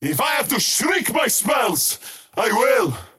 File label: skywrath crown cast pain; skywrath mage